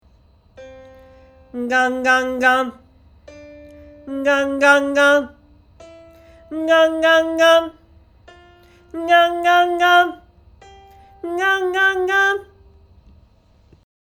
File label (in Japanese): フォルマント